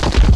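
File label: mausoleum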